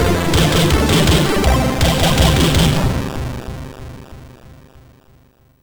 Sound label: MOS6581R4, sid; Quad 2